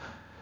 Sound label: techno